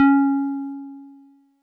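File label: Wood Piano DX100 C#4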